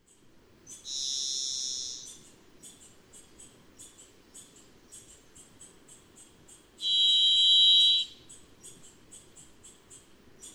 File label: Varied Thrush